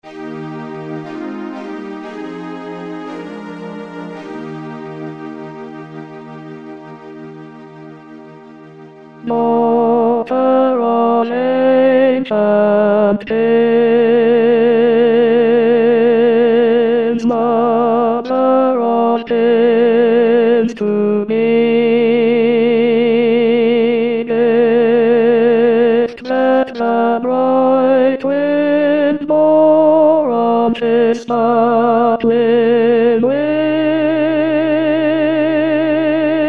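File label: tenors; Elgar; Coronation Ode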